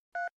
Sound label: phone dial